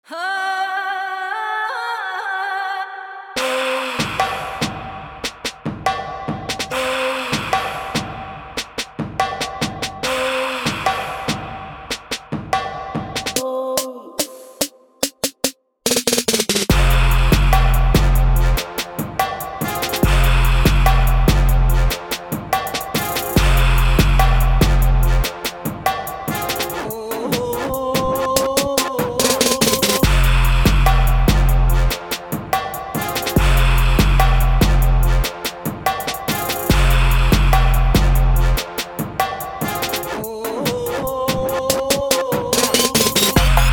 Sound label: TRAP BEATS